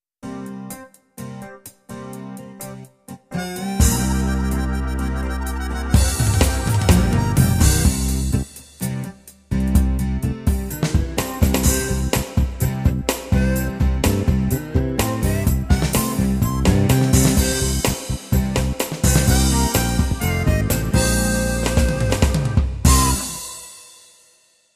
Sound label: ROCK